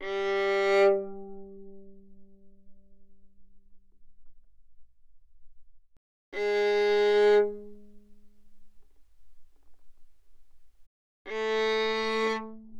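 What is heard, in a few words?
Violin arco fortissimo sul G G3 B3; Strings; violin2012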